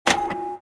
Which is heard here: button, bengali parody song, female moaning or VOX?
button